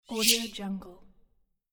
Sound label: tech slide